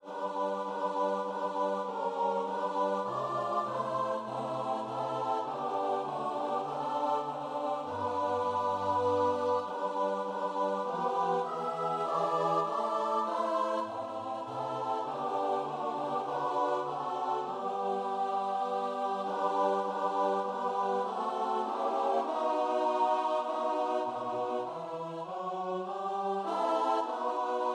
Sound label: CHOIR; little town